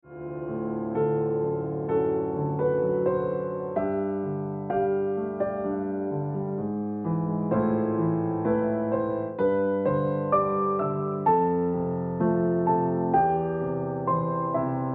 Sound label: Ringtone Piano Music